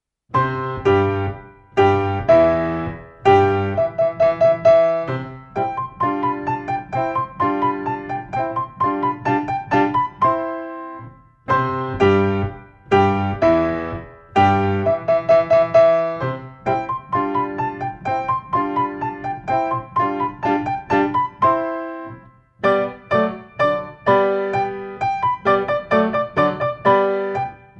German Dance; Mozart

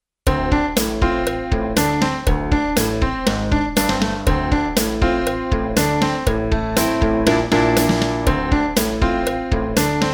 Etude in Syncopation

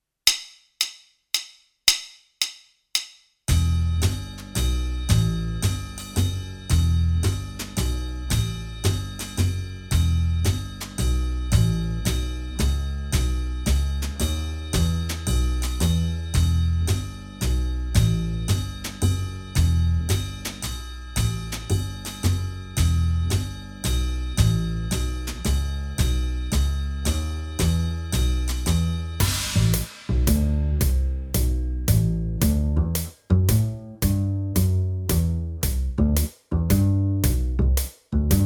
Swing Cat Track

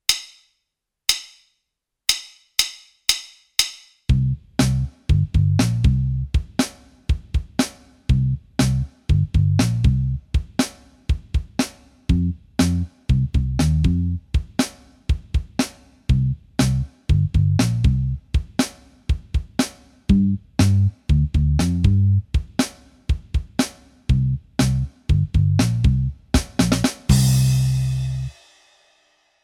Upbeat Track

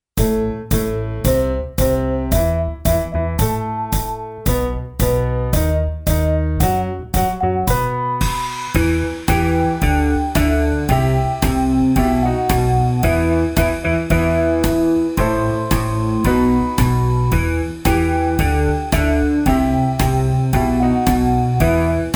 Swing Time

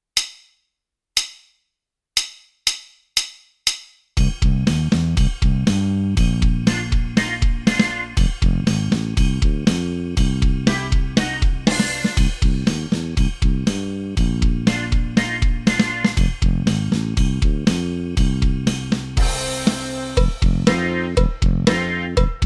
Rockin Rhythm Track

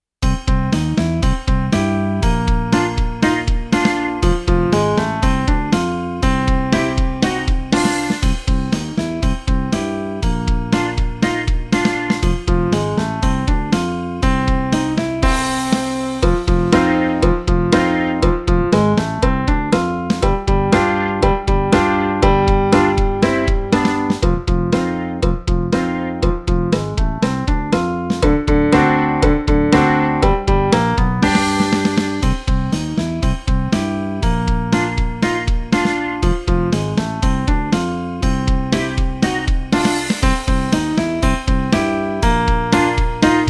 Rockin Rhythm

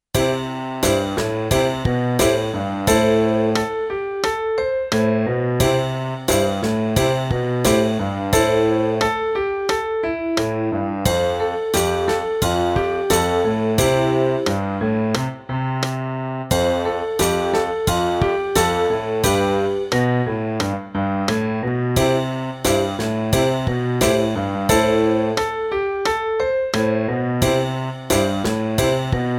Dreamy Dance

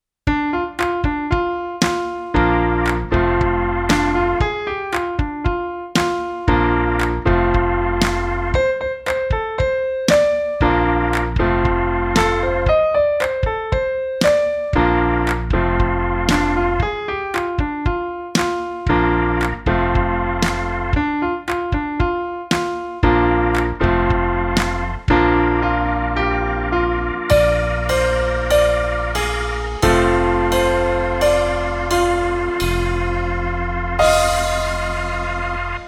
Gospel; Gospel Meeting

